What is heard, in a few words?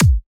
Drum